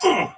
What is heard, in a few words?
Pain Soldier Sword Agent Male, Battle; Dialog; Barks; Melee; Sinecure